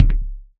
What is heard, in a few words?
glass, footsteps; Dystopia